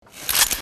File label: Clic; KNAPPAR; FJÄDERLJUD